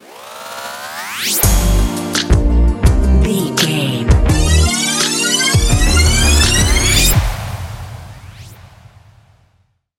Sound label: sting